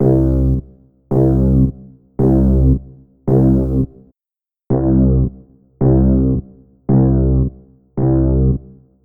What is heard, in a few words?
Synclavier Bass Bitrate